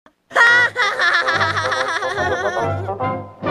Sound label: Laugh; Felix The Cat